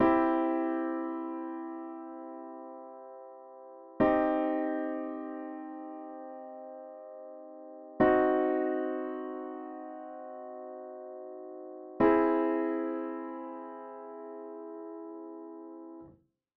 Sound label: Major Minor Augmented Diminished Triads; musical-u